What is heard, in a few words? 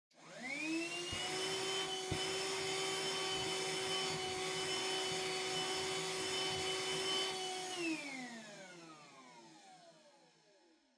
Vacuum Cleaner Far Vacuum Cloth; 掃除機; 遠い バキューム 布